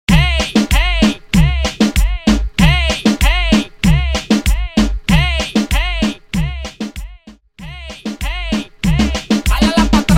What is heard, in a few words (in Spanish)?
Transicion Reggaeton To Dembow